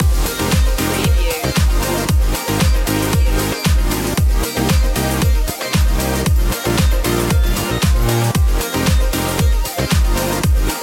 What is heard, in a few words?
start up groovin loop